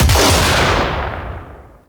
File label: Shotgun Shot; Kahl; Symbilyst Finisher; Warframe; The New War